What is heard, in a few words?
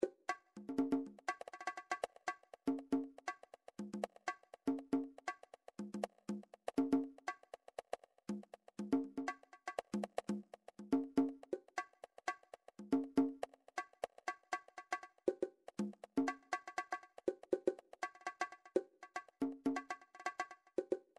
midi vol 4 drums percussion